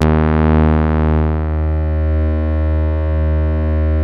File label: PHAT BASE, BASSES; Masterbits; Vintage Voltage